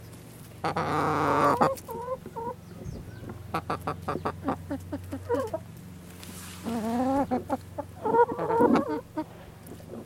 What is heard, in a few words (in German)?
huehner